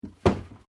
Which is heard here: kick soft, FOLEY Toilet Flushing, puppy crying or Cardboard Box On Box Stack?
Cardboard Box On Box Stack